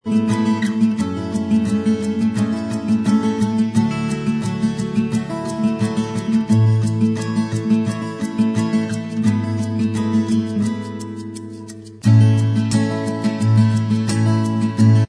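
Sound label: acoustic version instrumental track, backing tracks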